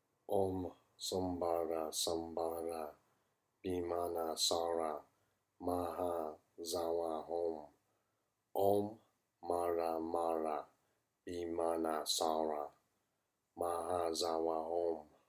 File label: Multiplying Virtue Mantra